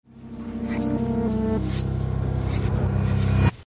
dramatic, reverse

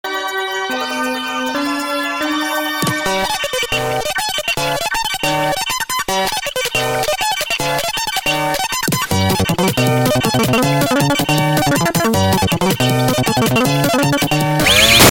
funky piano

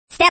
step

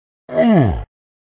ungh

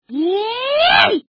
toad yay, reverse

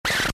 scratch

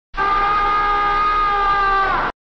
scream

